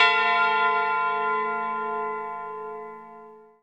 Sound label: Synths F; vengeance essential club sounds-3